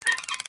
Turn Crank

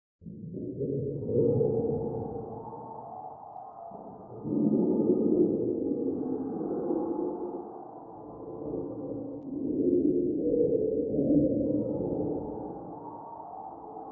Dangerous Mood Ambience

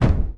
Jester Stomp

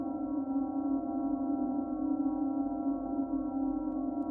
Low Action

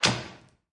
Loud Creak

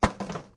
Drop Flashlight

